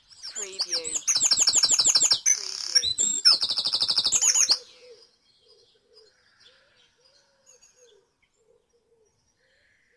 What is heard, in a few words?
Dawn Chorus in Mold North Wales